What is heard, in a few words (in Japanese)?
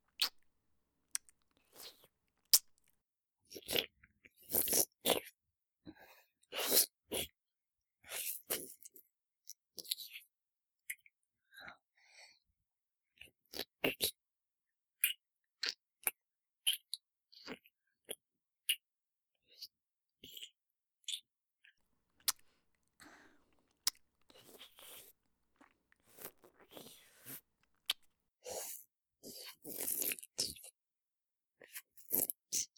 耳舐めオンリー 左耳, ASMR